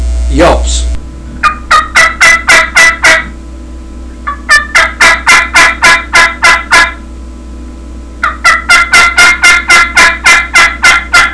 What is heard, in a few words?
yelps, turkey hunting